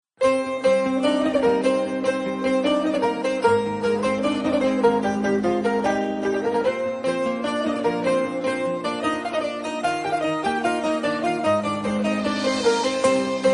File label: Instrumental Ringtone